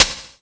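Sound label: SP-12, drum machine; Best Service Gigapack 2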